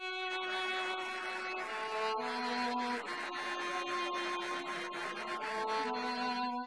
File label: Castanets Violin Applause